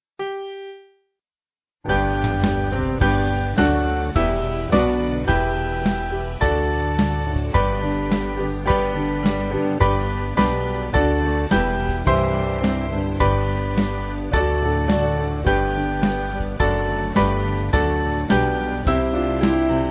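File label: Band, Music Backing; The Doxology; Old 100th; All people that on earth do dwell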